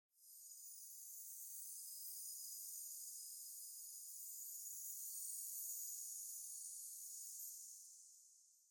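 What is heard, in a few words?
SFX Bank, Call And Response Yellow Fill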